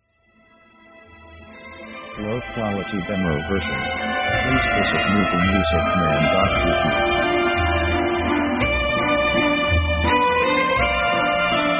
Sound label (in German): walz, orchestra; orchestra themes